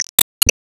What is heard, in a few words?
Emotion Sigh